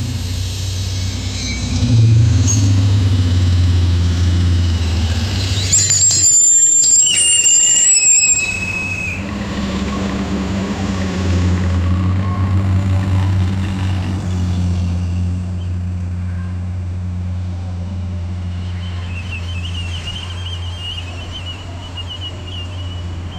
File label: on a city street gallopin, clip clop